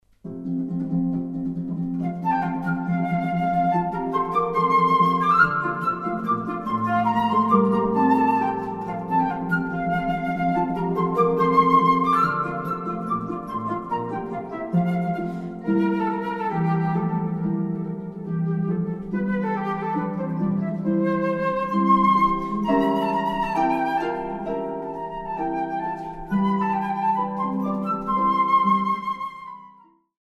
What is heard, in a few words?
tambourin, harp